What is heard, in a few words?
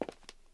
footstep; gmtk24